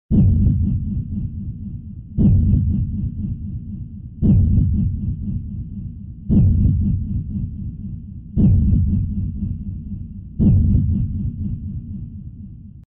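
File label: film and TV drama centre jump rhythm delay reverberation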